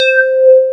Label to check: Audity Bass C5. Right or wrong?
Right